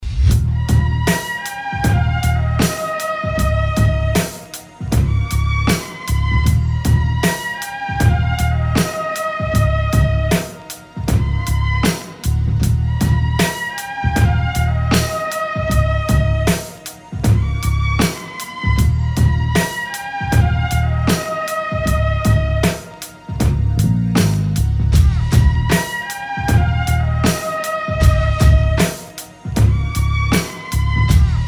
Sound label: Instrumental